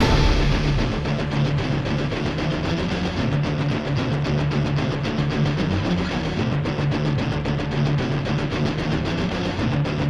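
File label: music, no drums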